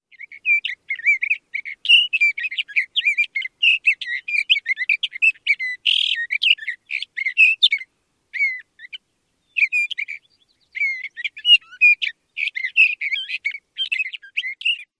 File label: song; WY